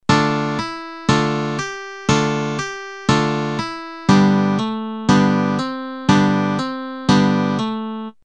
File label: solo